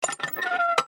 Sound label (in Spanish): sin agua; mekanta